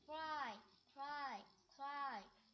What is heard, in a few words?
cry